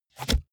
car bmw e46 interior sun visor open

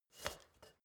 step ladder metal scrape single

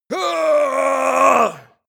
male fight long; schreie; menschen; soundpool

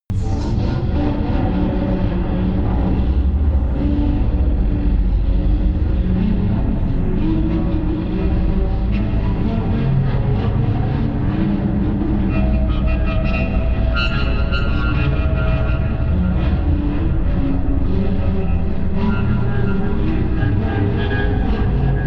drone industry

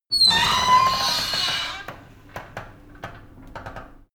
metal door screak thriller open

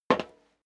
step leather shoes metal rattle single right; schritte

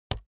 keyboard laptop enter push hard

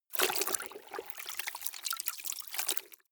clothes water wring medium

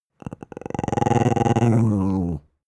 dog small growl stutter; natur-tiere; hunde

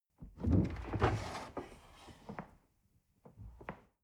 dishwasher door open

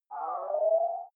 game start dull

